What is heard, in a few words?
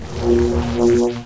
buzz, hornet; XDM